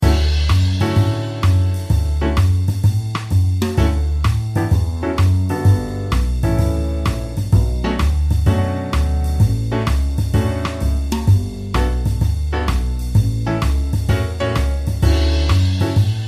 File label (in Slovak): hudba; inlogic-checkerslegend